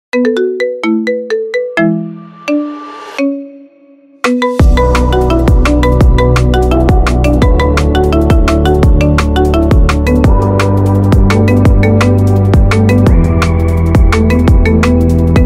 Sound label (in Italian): Marimba suoneria